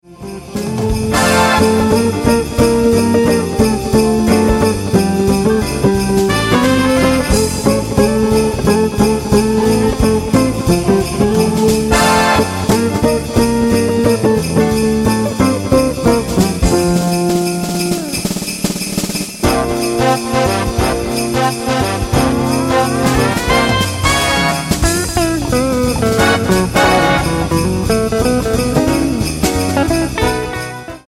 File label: jingle bells